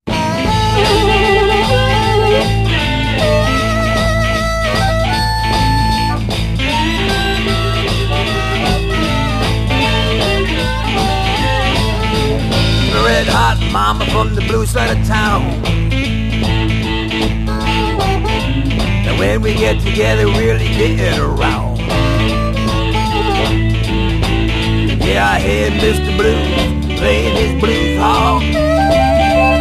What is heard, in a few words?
Blues Harp